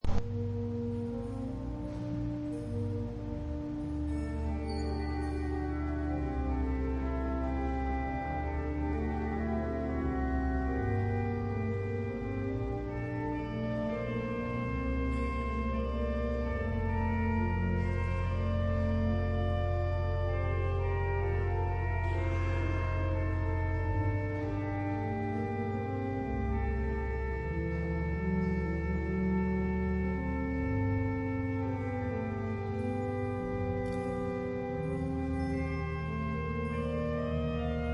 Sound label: Organ interlude